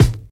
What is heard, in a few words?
studio kick one shot a sharp key; kick drum samples